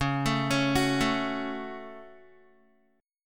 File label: Db Suspended 4th Sharp 5th Guitar 4E,4A,5D,5G,5C,6F; arpeggiated; 9,12,11,11,9,x